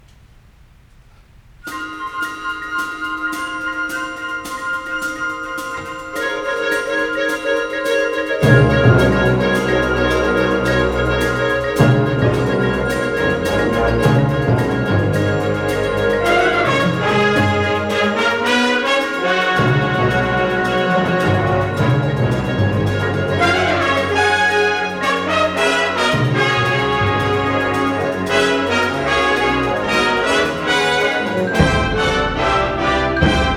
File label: bandancing city shuffle